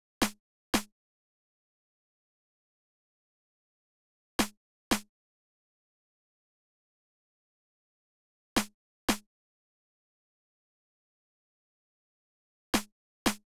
Drum Loop, 115 BPM Snare; Loop Stems; Cymatics; DOPE DRUMS VOL. 1; Coffee Drum Loop

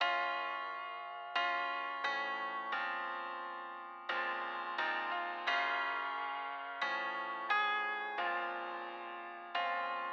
Classic Melody Loop 88 BPM A# Min Keys; Melodies; Loop Stems; Cymatics; Oracle Sample Pack

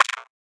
Perc, Psytrance; Hats & Percs

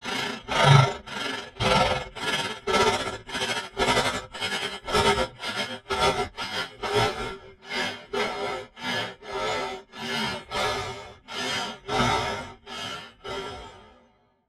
Weird scary

